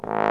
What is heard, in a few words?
THE TROMBONE